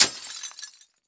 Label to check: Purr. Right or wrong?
Wrong